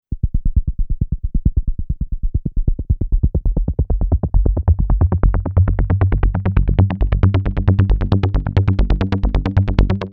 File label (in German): Huellkurve Filter